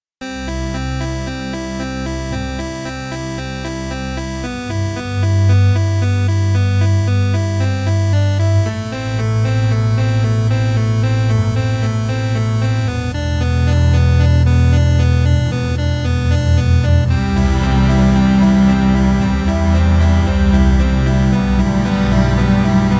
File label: Sad Version; Rogue Legacy; Whale. Shark.